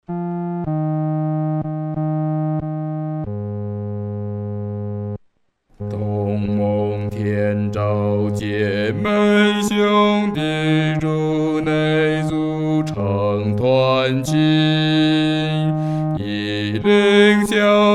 独唱 第四声

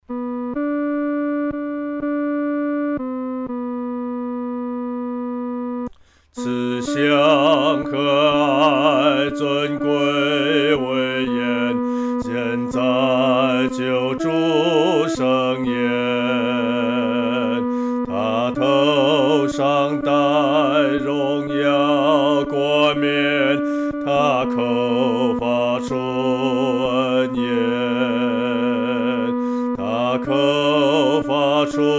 独唱 第二声

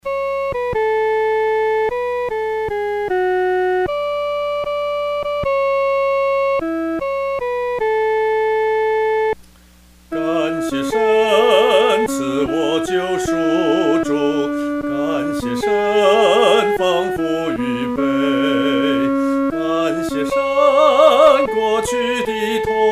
独唱（第一声）; 女高

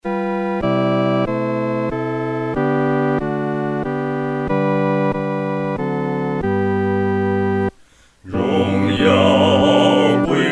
合唱 四声部; 荣耀归于真神

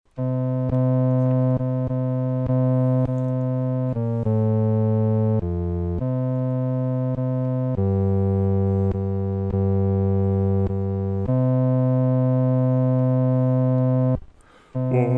独唱 第四声

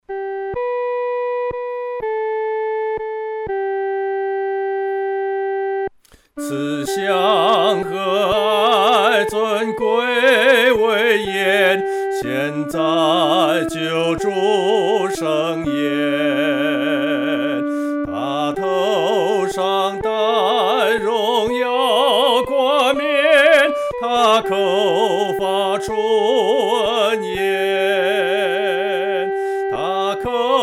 独唱 第一声

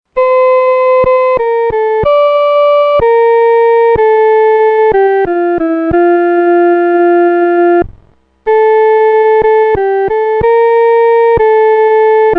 独奏 第一声

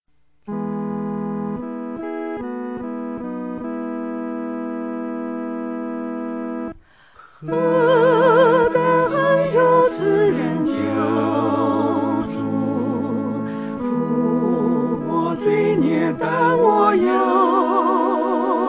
何等恩友 合唱 四声合唱

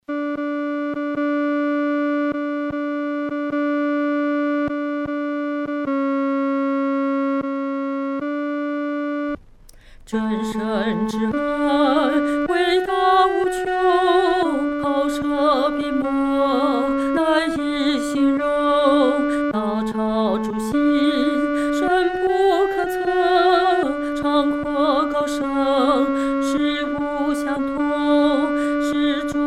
独唱 第二声

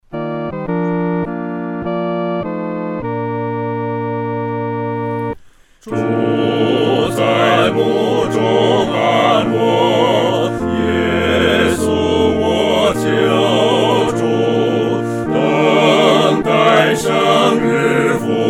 合唱 四声部; 基督已复活

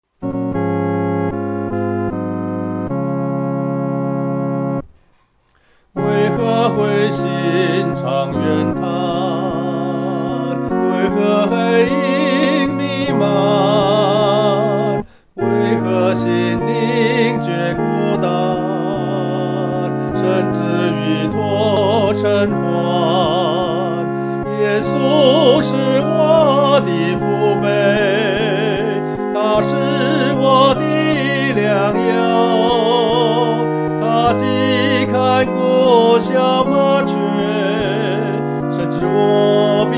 合唱 四声部

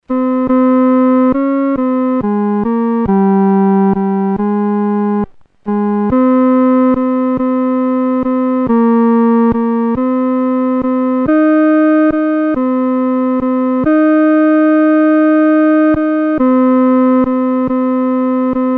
独奏 第三声

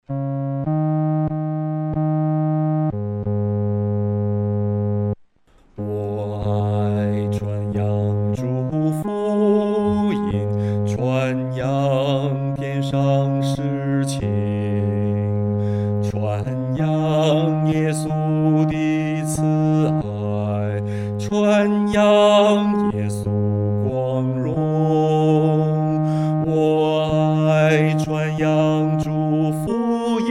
我爱传扬主福音 独唱（第四声）

